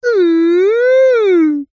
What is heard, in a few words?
human male say oooo as in replying to insult